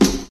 Dope Snare Drum Sound F# Key, snare sound tuned to the F# note